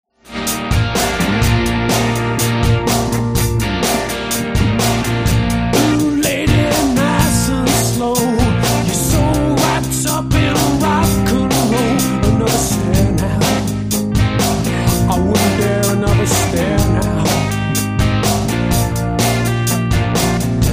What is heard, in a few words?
dangerous guitars